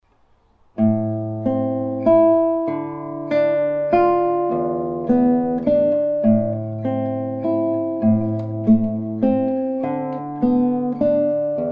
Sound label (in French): valsette